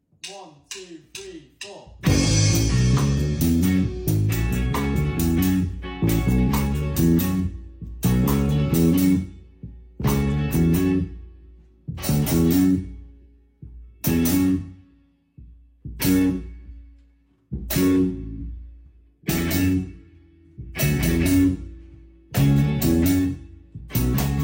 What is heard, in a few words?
instrumental